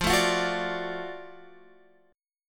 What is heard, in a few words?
F sharp Minor Major 7th Sharp 5th